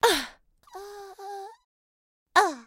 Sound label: small crash; talking tom gold run gamerip 2016; Angela